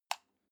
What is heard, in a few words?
light switch off soft; schalter